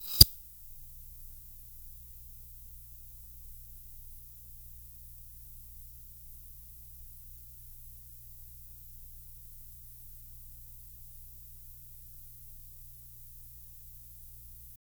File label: long harsh squeaky tape